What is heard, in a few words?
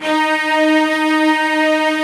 strings D#3